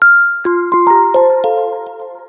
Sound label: ring; fldigi; alert clips